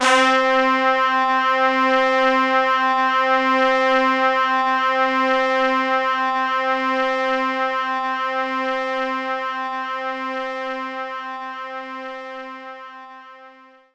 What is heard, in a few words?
Brass Section C4; knights try